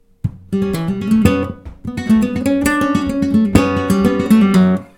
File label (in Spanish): falseta